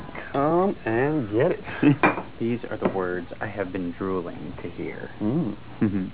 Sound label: drooling